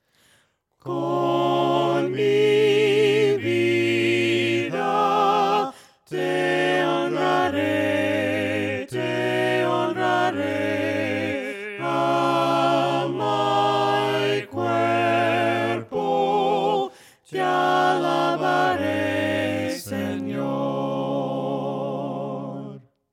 VOCES, himnos a capella; cantos del camino; CON MI VIDA

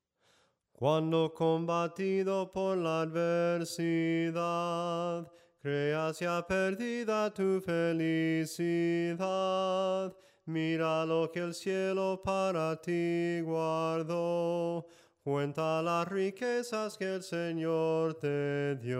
SOPRANO CANTADO, himnos en melodia soprano

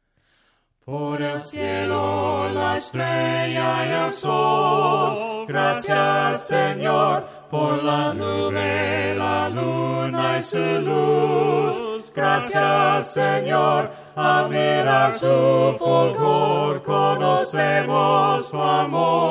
VOCES, himnos a capella; cantos del camino; POR EL CIELO LA ESTRELLA Y EL SOL

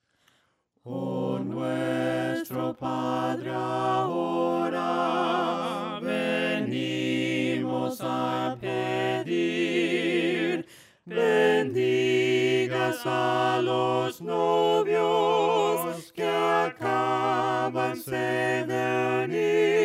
VOCES, himnos a capella; cantos del camino

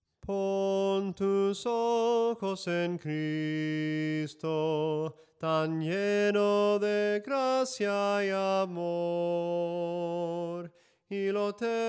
SOPRANO CANTADO

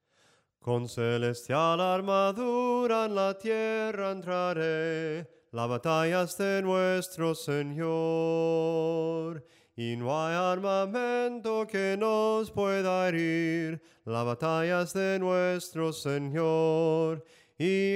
SOPRANO CANTADO, himnos; LA BATALLA ES DE NUESTRO SENOR

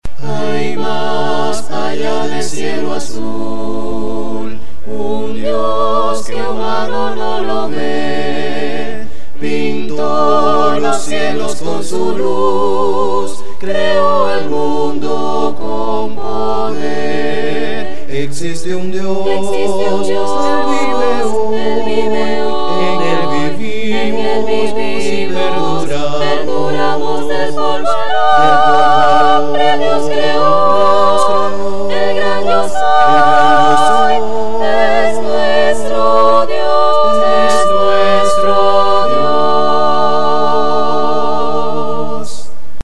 soprano cantado; NUESTRO DIOS VIVE HOY

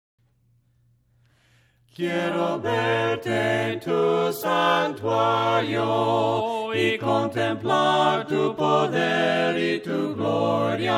DIOS MIO VOCES, himnos a capella; cantos del camino

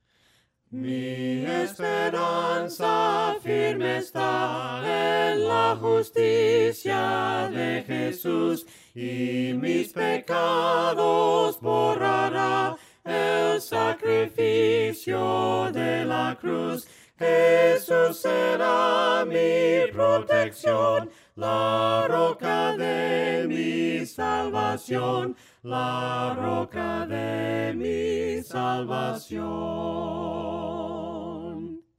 VOCES, himnos a capella; cantos del camino

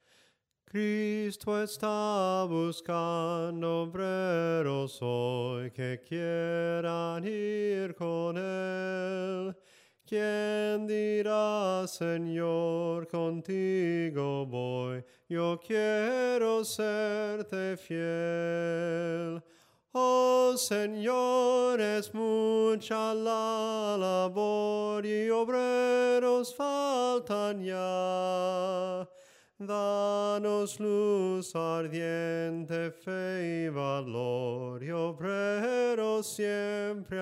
SOPRANO CANTADO, himnos en melodia soprano

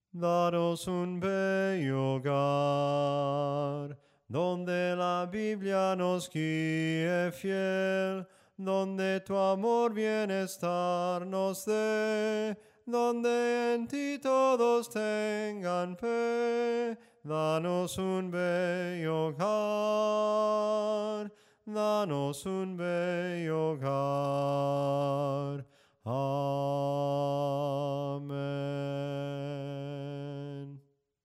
SOPRANO CANTADO, himnos en melodia soprano

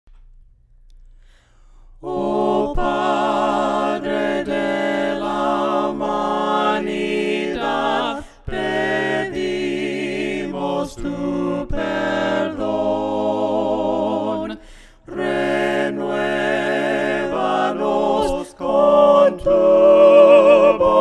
VOCES, himnos a capella; cantos del camino; OH PADRE DE LA HUMANIDAD

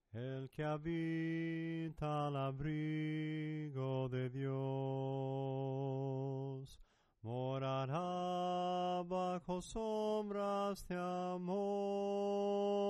SOPRANO CANTADO, himnos en melodia soprano

